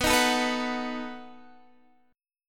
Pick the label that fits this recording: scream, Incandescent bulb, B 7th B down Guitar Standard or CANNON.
B 7th B down Guitar Standard